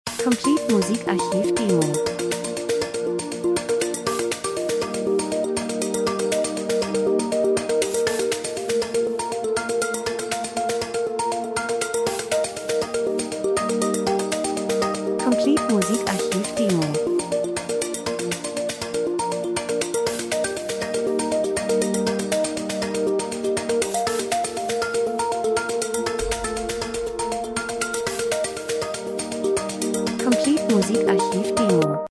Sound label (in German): drums, rhythmus; Variation Sequenz Klangwolke,Gefühle Wohlsein Emotion Gleichmäßig Liebe Natur Percussion Synthesizer